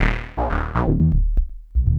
Synth Bass; Basses

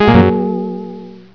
Enemy Death; Bombzuka; Retro

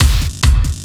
Dance Drumloop; Drums; Dance Beats

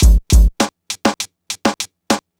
Urban Beat, Drums

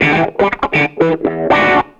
Electric Guitar, Loops; Guitars

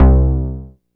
BUZZ; SoundFactory; BASS

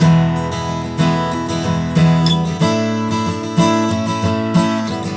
Acoustic Guitar; Guitars

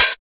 Hi-Hat One Shot; Drums

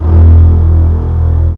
fat bass; SoundFactory

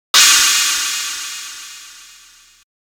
china cym; pitchshifter PSI

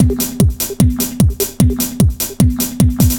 Dance Drumloop; Drums; Dance Beats

